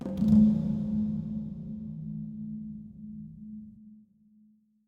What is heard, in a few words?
perc spot